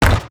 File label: giant scout step; Garry's Mod; tf2; mvm